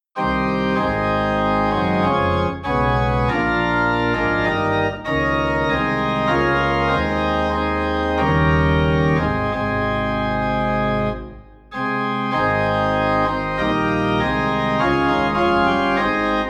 Organ Low in A Flat; Almighty Father Strong to Save

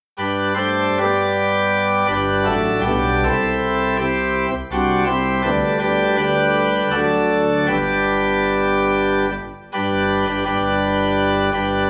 Organ in G; All the Way My Savior Lead Me

